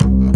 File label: Upright Bass Notes